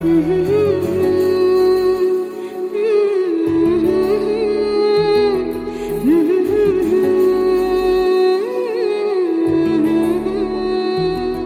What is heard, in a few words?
Tune Ringtone; kgf 2